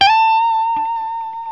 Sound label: LES PAUL A 5; Spectrasonics; Hans Zimmer Guitars VOL-1